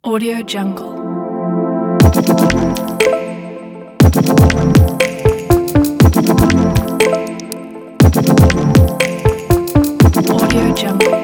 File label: intro tech logo; mangoaudio